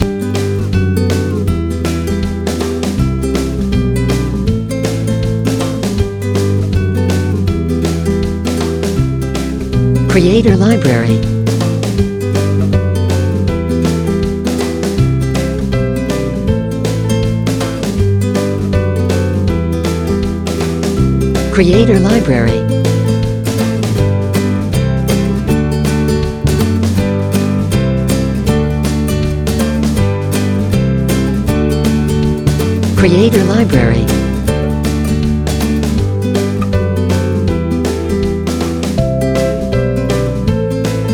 Looks Like Success, Folk Acoustic

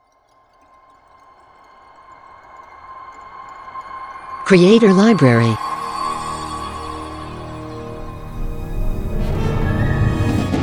Ice Logo; Logo-Idents

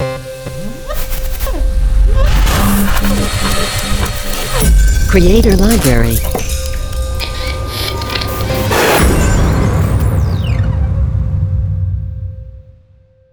Digital Reveal; Logo-Idents

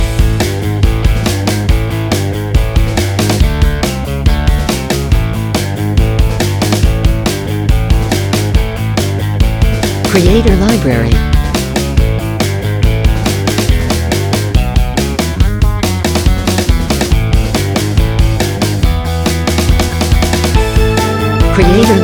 Rock n Roll Party

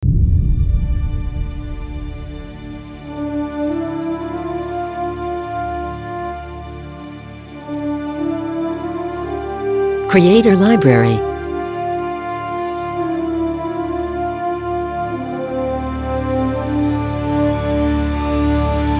Dramatic Movie Trailer, cinematic music

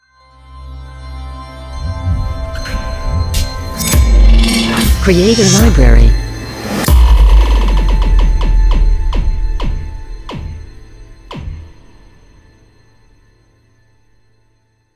Modern Electronic Logo; Logo-Idents

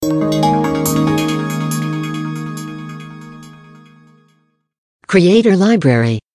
Audio Logo

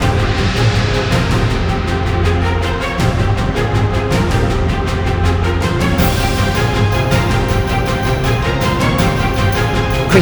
Epic Battle Sequence, cinematic music

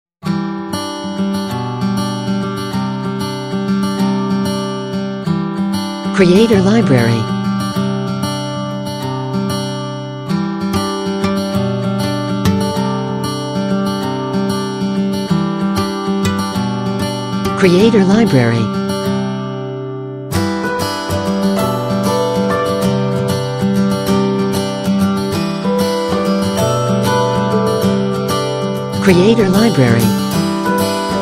Acoustic

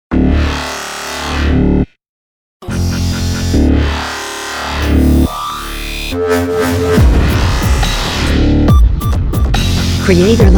Destruction, electronica music

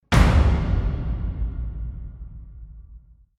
Trailer Hit

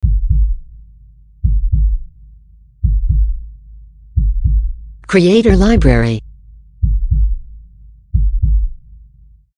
Heartbeat With Reverb

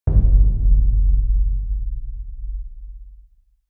Trailer Hit

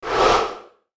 Short Swoosh

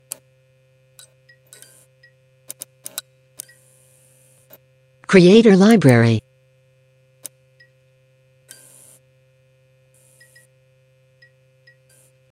Neon Light Flicker

